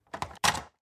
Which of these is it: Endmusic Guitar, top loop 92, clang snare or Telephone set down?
Telephone set down